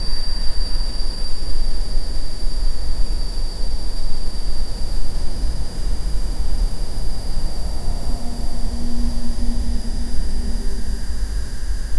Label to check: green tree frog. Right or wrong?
Wrong